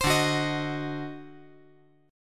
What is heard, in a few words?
D 7th Flat 9th D down, chord